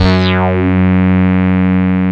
SYN MORP, Roland

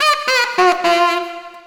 Sax Squonk; Sonic Foundry (Sony Creative Software); Knutrix Drum & Bass

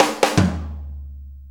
Shuffle Fill, Drum Tools

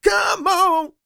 Vocal Planet R&B & Dance; ILIO

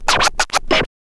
scratch short, Vinyl scratches

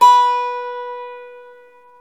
GTR 12, Ac 12+ E.12; Club-50 - Foundations Roland; Dual Guitar

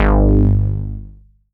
FROG BASS; Masterbits; Dance Trax

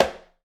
PRC XCONGA, Skin Drums

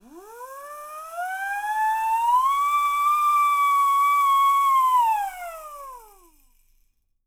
SIREN; Miroslav Vitous - Percussion & Harp

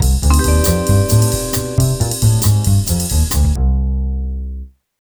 TV Suspense Full C, Lounge 135; Zero-G Kocktail Kollection; Construction Kitz (Lounge); TV Suspense Mixes 135

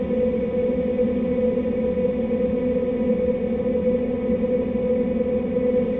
InfiniVerb Voice, sparse soundscape Loops

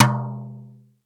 SLAP TOM M; East West; Global Sounds

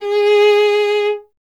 STR VLA, Solo Instruments

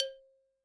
Xylophon, Yamaha DTXpress-II

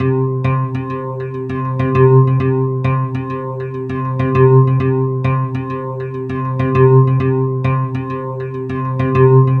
Guitar Pulse; Sonic Foundry (Sony Creative Software); Loop Noir; Session 12 - Otherworld Journey

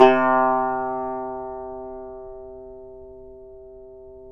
yayli tambur

